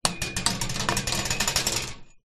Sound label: small metal pole scrape along thin grated metal; foley